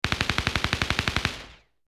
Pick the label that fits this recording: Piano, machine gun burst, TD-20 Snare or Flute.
machine gun burst